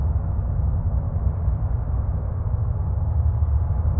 sparse soundscape samples; ReLooped

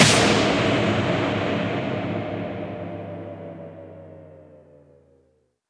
Impacts, Effects

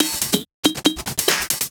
Loops 140 BPM Hihat Percussion Loops

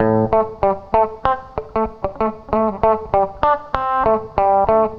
FUNK, FUNKY GTR

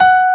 PIANO; sound canvas

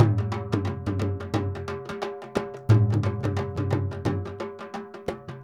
INDIA L, Heart of Asia, IND ENS

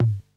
KPR LO TOM, KPR-77; Hollywood Edge; The Big Bad Beatmachine

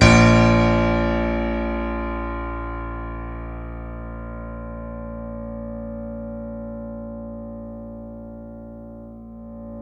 C7 FF, The Ultimate Piano Collection; East West

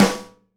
SNARE RIM, Live Snares

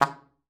C#3, ALTOSAX; Xsample VOL-2

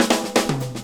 FILL

